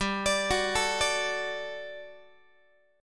G Major 7th Suspended 2nd G down Guitar; GM7sus2; arpeggiated

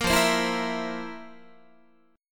F Major 7th Suspended 4th F, Guitar; strummed

